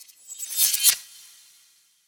Reverse Glass Effect; Mechanical Wave

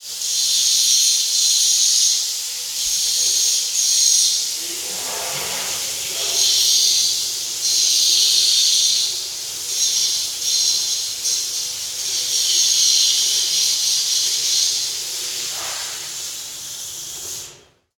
Steel Welding & Grinding, Machine, Industrial; Jake Fielding; Symphony of Steel - Steelwork Ambiences

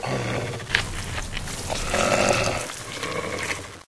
eat; hl2; zassassin

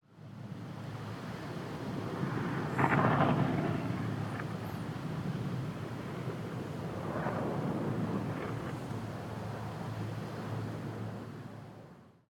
Parachutist - EXT - PARACHUTE DEPLOY - Distant; Pole Position; The Skydiving and Parachute Library

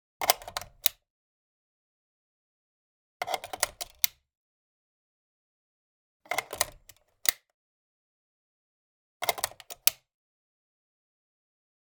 SVD Dragunov 7.62×54R Magazine Insert Slow; Dramatic Cat; CO-100K

